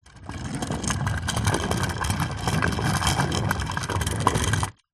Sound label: toy train wooden roll wood floor